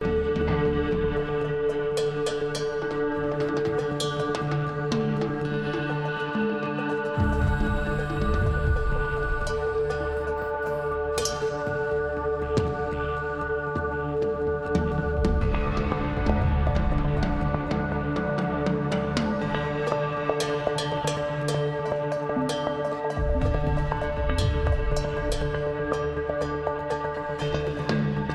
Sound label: Drums